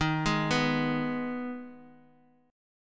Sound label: Eb Suspended 4th Sharp 5th, Guitar Standard; Ebsus4#5 arpeggiated; 11,11,9,x,x,x; JGuitar